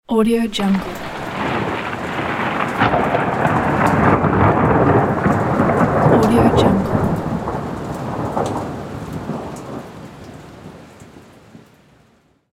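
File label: lightning strike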